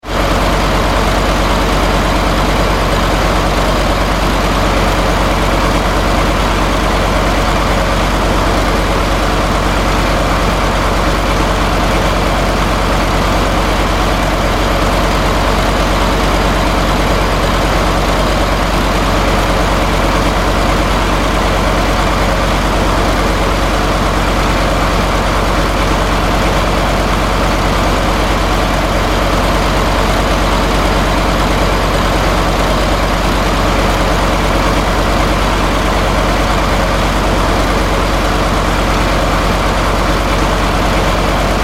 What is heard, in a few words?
Generator